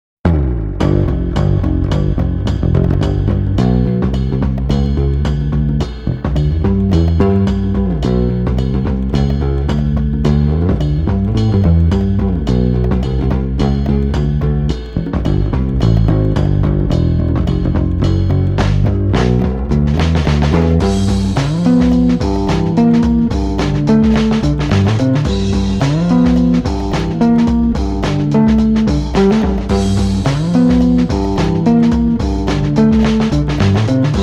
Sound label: bass; rv 5 tone demo